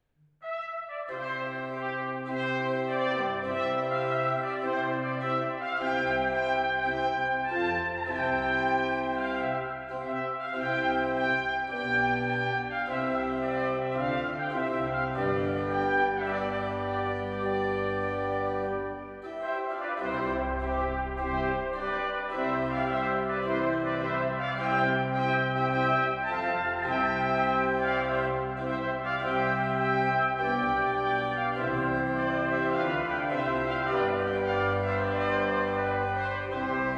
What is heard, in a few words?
Trumpet Fanfare; Mouret